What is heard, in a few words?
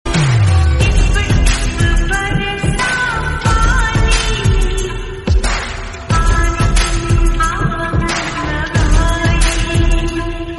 hindi song; sooryavanshi